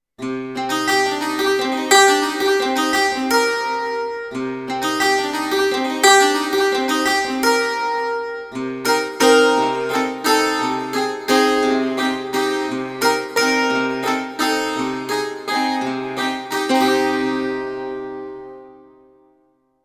Dulcimer Guitar Cage

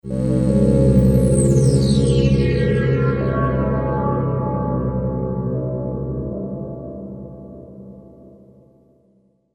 Ringmodulator

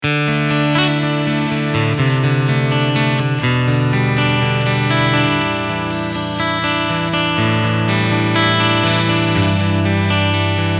Piezo

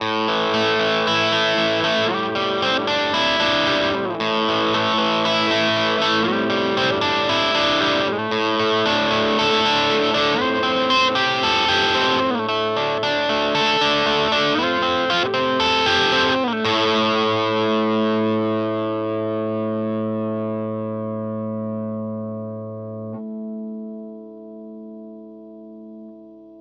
Hagstrom Ultra Max Bridge

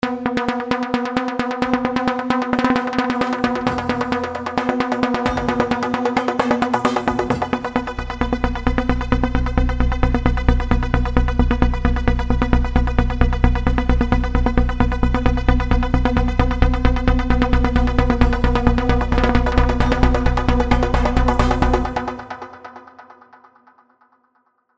PL Te Net Rattler; Vengeance Sound Avenger 2; Generative 1

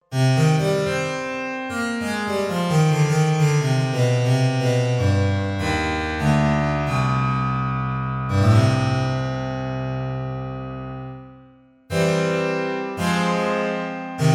Struck Grand Piano; Reason 10; Pangea; Storytelling

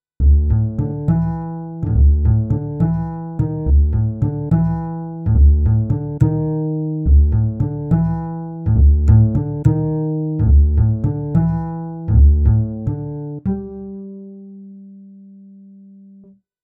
Korg SV 2 Acoustic Bass

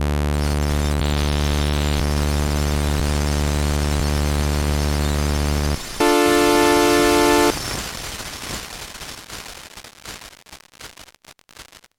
MODOR NF 1 Dely Time

